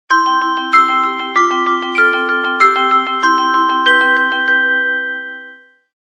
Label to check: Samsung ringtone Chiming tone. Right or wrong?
Right